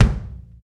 rich mid range kick drum single hit b key, kick drum sample tuned to the B note